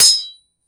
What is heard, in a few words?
steel sword hit